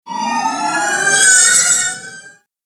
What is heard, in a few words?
agressive motion cut